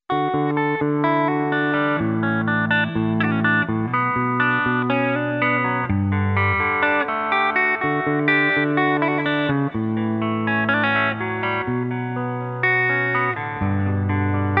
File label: spirit ghitar